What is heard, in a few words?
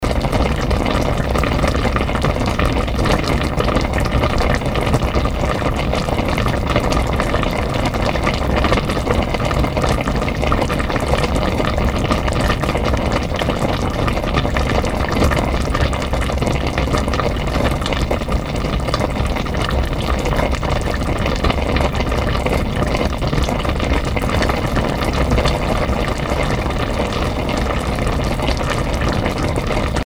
Simmer